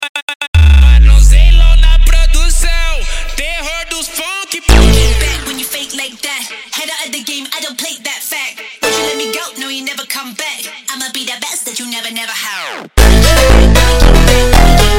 Phonk MONTAGEM

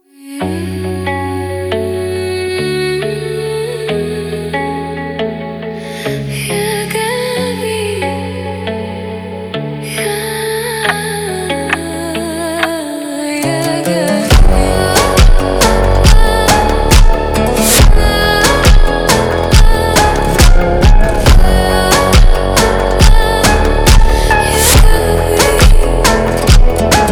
Phonk Slowed

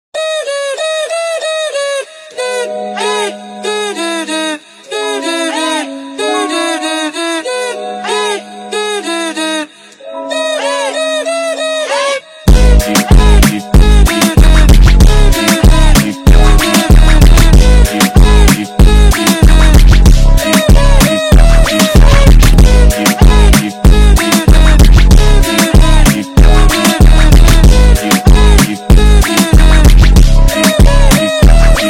Phonk

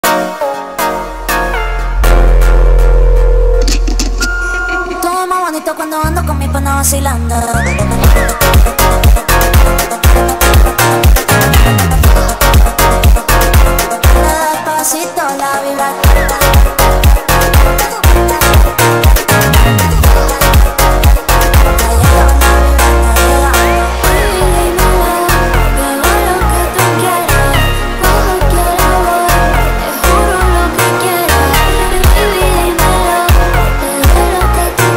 Phonk

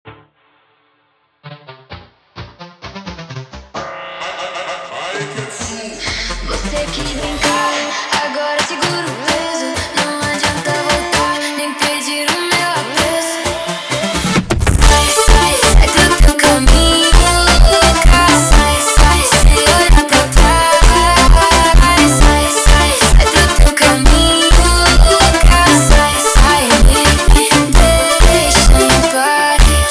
Phonk

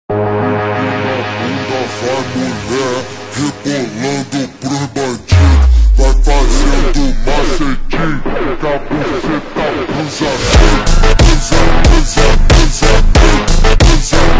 Phonk Ultra Slowed

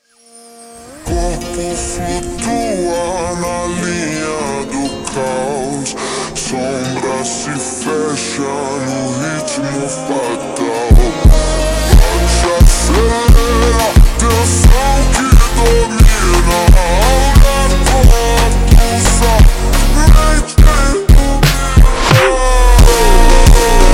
Phonk, Ultra Slowed

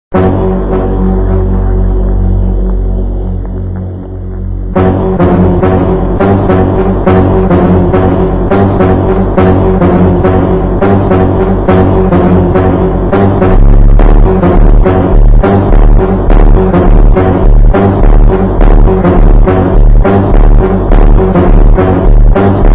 Phonk MONTAGEM Super Slowed

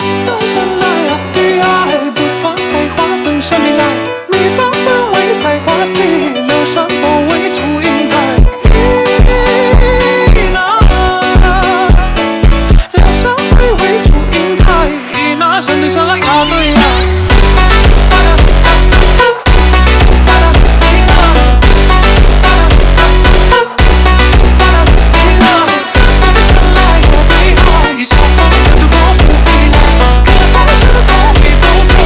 Phonk Super Slowed

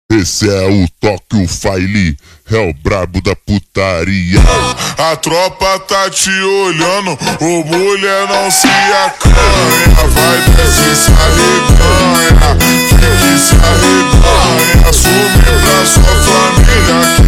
Phonk MONTAGEM Super Slowed

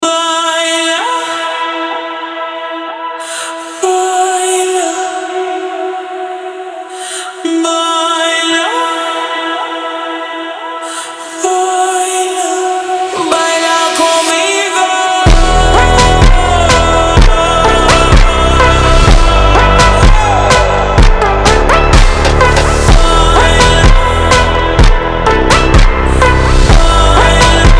Phonk Super Slowed

